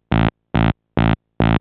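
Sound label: SYNTH BASS DANCE 140 A; eJay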